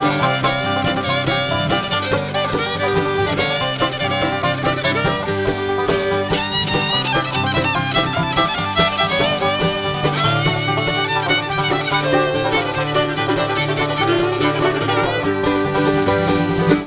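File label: bluegrass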